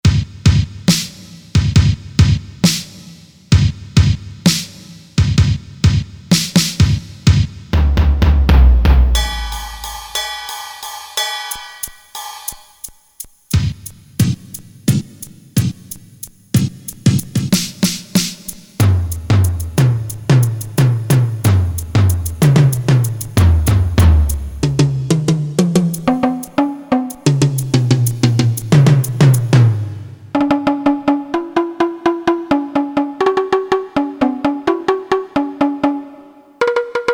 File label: PERC, Combination Snds, Korg DSS-1